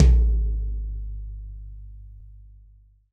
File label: TD-20 Tom B, Roland TD-20 Toms